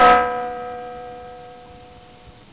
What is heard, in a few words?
gong, Railway; OpenBVE